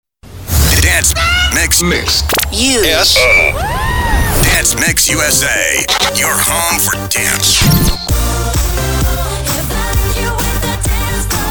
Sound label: beatmix; dancemixusa